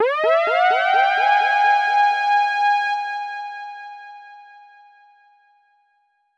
siren

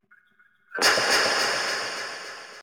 crit sfx

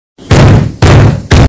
smashing table

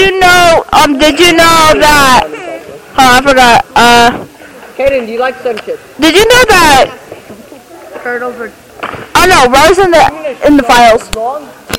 average 6th grade lunch table

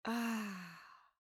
ahhhhh

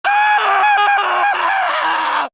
scream

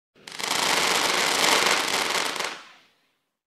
firework crackling

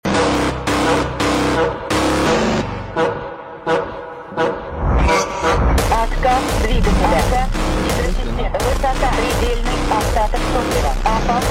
dubstep

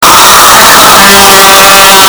speeed screaming

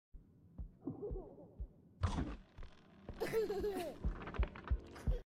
shadow child spawn

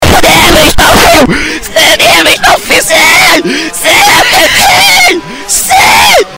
reverse

